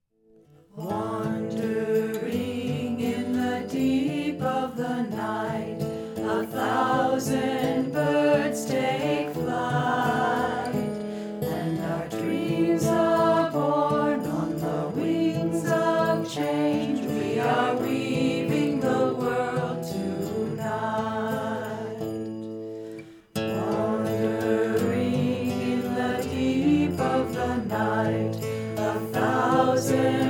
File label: Chants